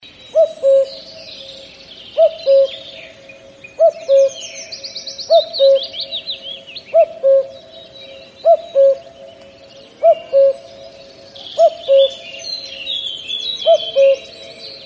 soft cuckoo sound message tone ringtone, sms ringtones